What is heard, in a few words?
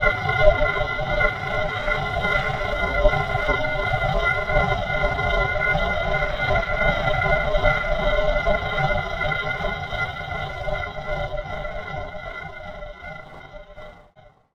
Waggon, Noisy; Zero-G Seismic Frequencies